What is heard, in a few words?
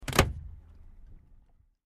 Boat Throttle Movement; Ranger Boat